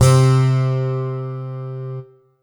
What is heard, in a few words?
Yamaha EX5 String C3